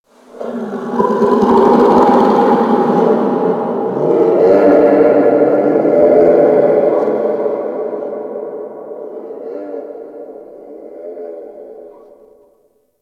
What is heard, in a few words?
Ice Creature Distant Cry; Warframe; Corpus Ice Planet; Ambience